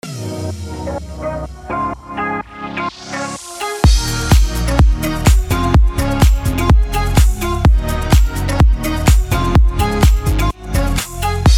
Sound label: IRISH FOLK HOUSE D#m 126BPM; BED